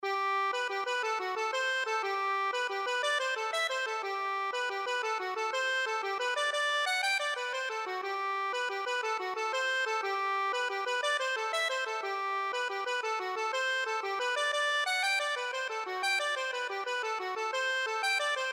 rolling jig, accordion; jacksons

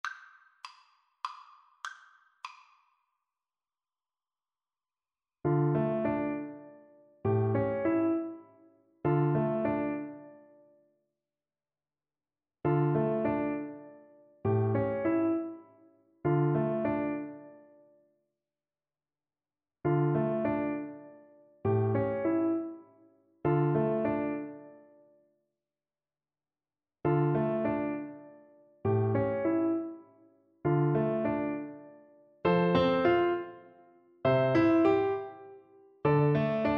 Oboe

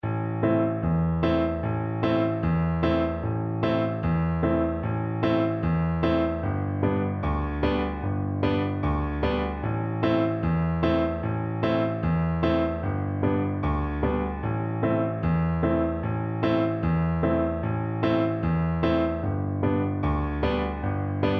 Oboe

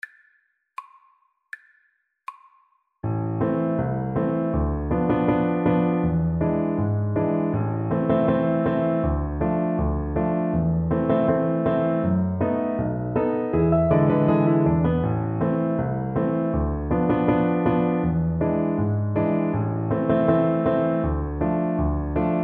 Viola

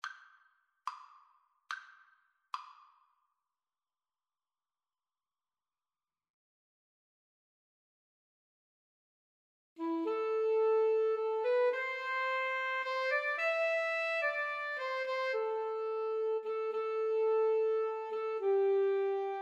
house of the rising sun, Alto Sax Guitar Duet

